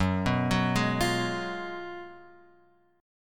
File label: F sharp Major 7th Suspended 2nd Suspended 4th; F#M7sus2sus4 Chord {2 2 4 1 X 1} arpeggiated